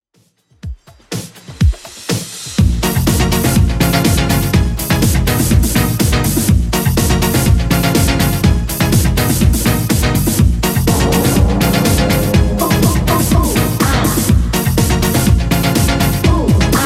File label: 80s Redrum 123bpm